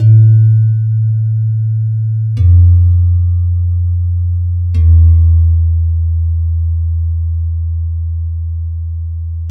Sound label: Pelog Slab Gong Bass; Melodic; Ambient-Melodics-II